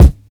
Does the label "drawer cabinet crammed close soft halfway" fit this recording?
No